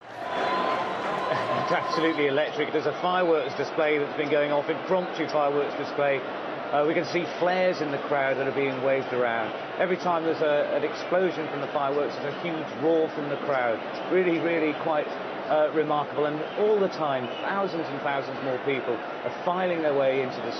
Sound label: cheers tears and fireworks in the crowd; cairo